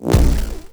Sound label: Hoverboard Land B; Vehicles; Warframe; Venus Hoverboard